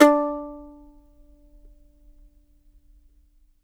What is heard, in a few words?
MANDO NOTES, D OPN; Sampleheads; Whole Lotta Country 1 & 2